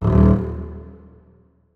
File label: notes, keyboard; Hideout Furniture